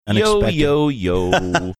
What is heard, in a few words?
singing yo yo yo